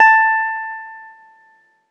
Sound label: ZITHER; F2; Best Service; Alpine Volksmusik